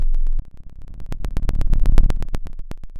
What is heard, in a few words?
pop, Sound of a fire crackling